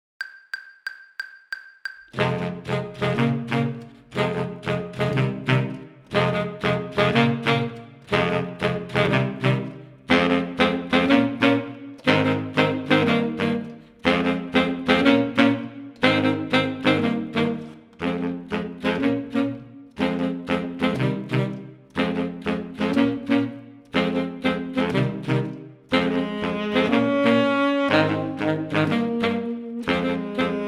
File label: playalong soprano; battlefield 1942